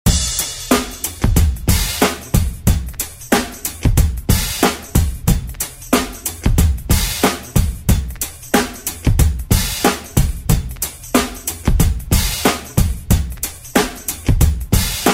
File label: Rock Redrum; Deejay Hit